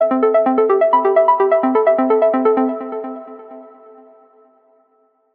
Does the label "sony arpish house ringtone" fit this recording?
Yes